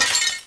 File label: glass, debris; XDM